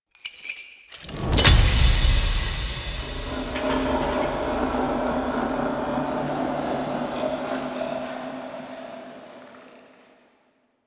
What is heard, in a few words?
Movement E; The New War; Ballas; Cinematics